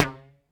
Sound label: Elastic Band, Foley; Foley Fx; cartoon; musicradar; cartoon caper